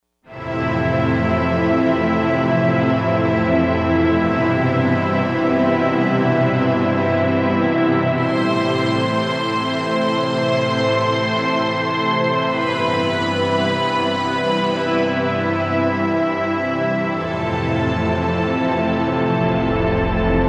Soft Lush; Digital Synthesizer

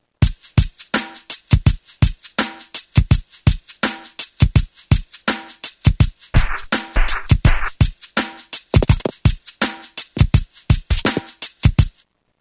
Soul Shake 83; Roland M-DC1

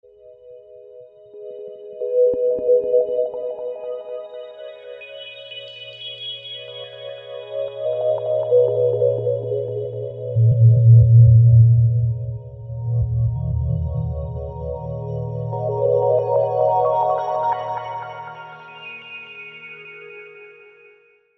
Rippling

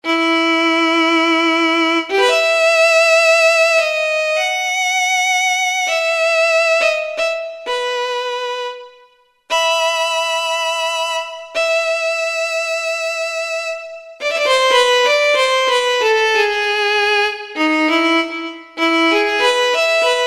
Marcato Violin; Kurzweil K2000 Orchestral ROM

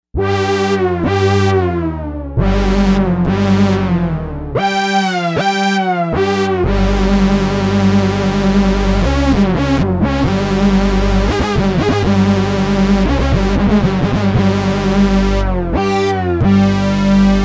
Hoovermatic, Novation UltraNova; Factory Patches

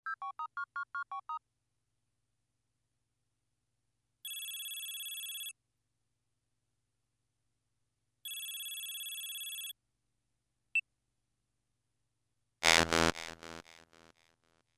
Jingle, Korg MS2000; Factory Demo Songs

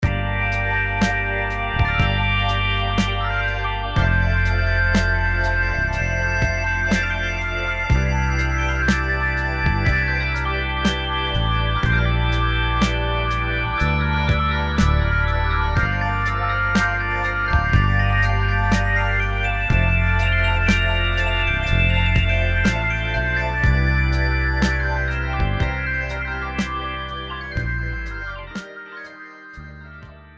Combinator Psychedelic Organ; Propellerhead Reason 3.0

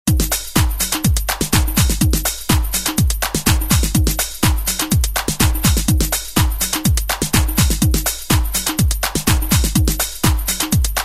909 Swing 124; Roland M-DC1

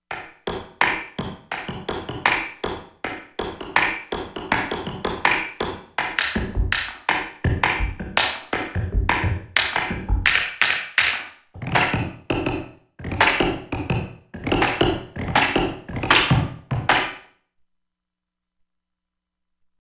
Hit; Environment

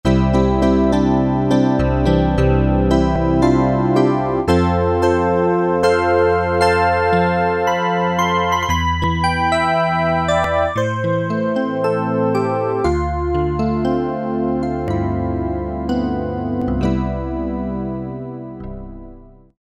DIGPNO, Ensoniq ESQ-1

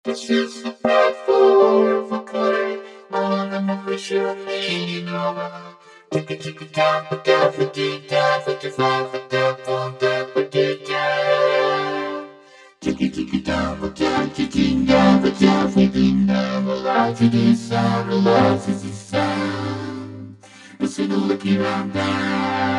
Pad 4 Vocoder

